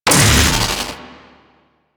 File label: Electric Zap Large; Ambience; Props; Warframe; Corpus Railjack